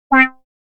Shorty, synth lead

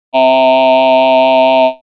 Voice aah

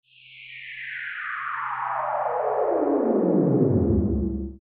Flying saucer

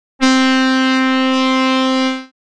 Wide, synthlead

